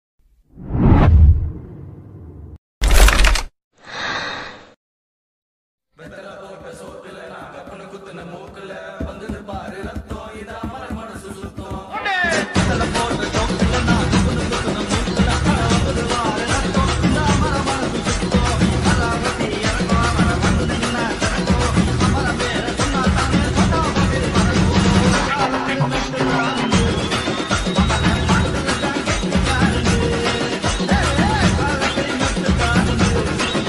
song remix, tamil

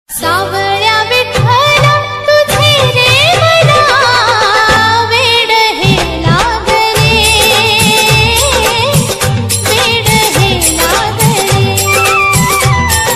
bhakti ringtone, marathi ringtone; vitthal ringtone; devachi ringtone

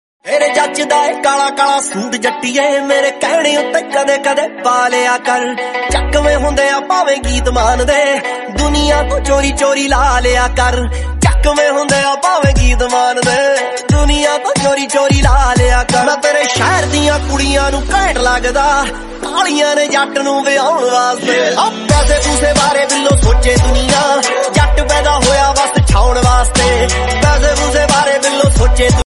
punjabi ringtone

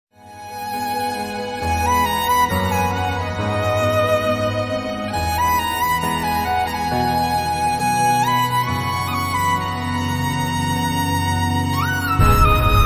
Malayalam sad ringtone feeling alone ringtone

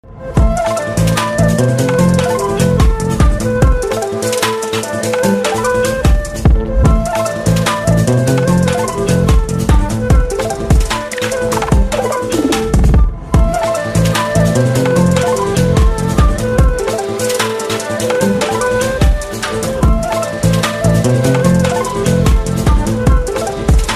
love bgm ringtone remix; premalu

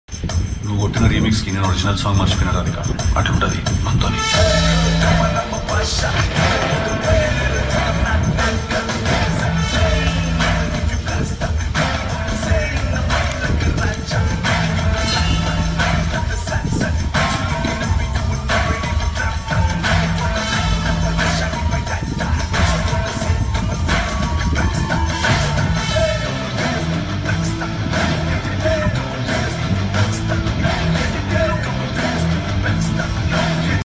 climax bgm ringtone; tillu2

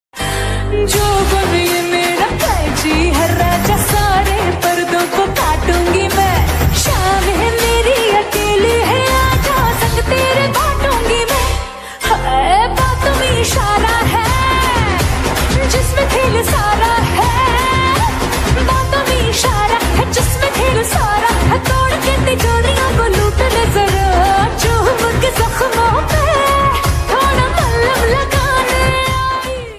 slowed reverb ringtone lofi ringtone

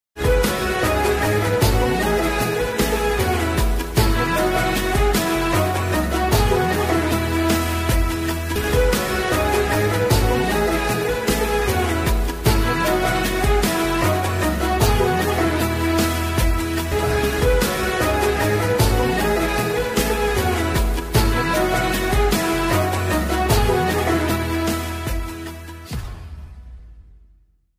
instrumental ringtone

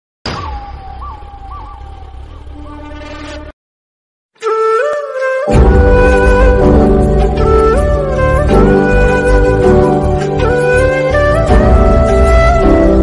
romantic bgm; oh my kadavule

